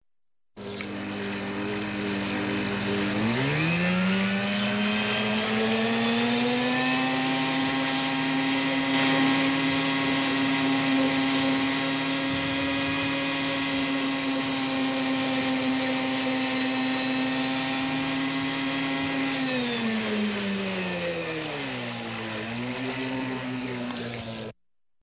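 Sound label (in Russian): моторная лодка 18 л.с. полный газ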